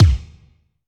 Kik Fazed, Alesis D4, Drum Machines

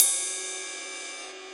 Ride Ping; Proteus 2000; Digital Sound Factory Proteus 2000

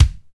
kick, natural kick; Roland L-CDX-01; KIK Natural K1

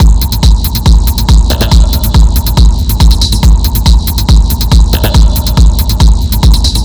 Drums Strange

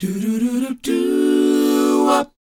DOWOP E; ILIO; Vocal Planet

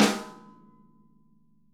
SNARE, Interactive Drum Kits

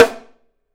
SN MAR HD, 3DPercussives; E-MU Producer Series Vol. 5 – 3-D Audio Collection

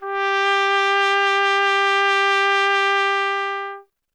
trumpet, G 0; Miroslav Vitous; Solo Instruments VOL-1

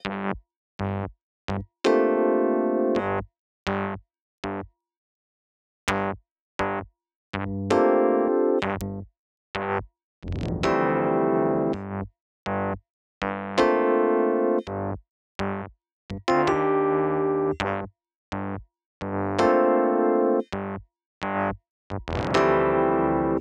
rhodes C, Smooth RnB; 082 Gmin; Big Fish Audio; Legendary; Shes Mine

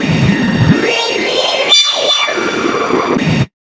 FX, Big Beat Drumloops; USB Soundscan vol.25; AKAI

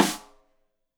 Rim Shot Stereo; Deeply Sampled Drums; SMDrum

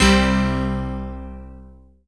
CHORDS; EastWest; Dance Mega Hip Hop Electro

